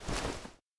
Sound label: Roll Dodge; Laura